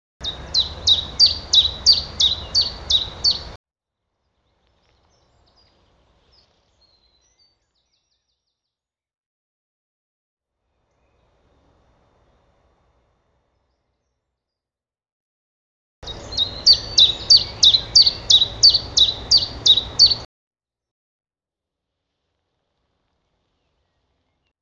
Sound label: Phylloscopus collybita